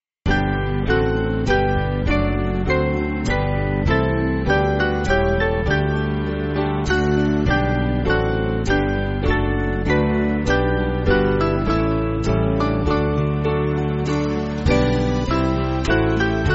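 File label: Band, Backing Files for Churches; Praise To Our God; Bede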